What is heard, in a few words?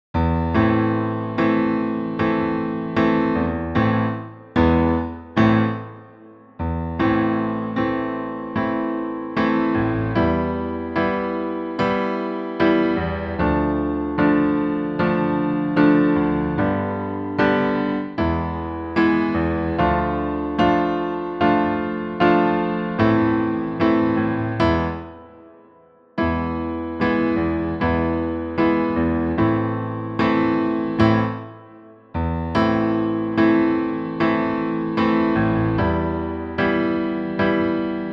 solo for lady chords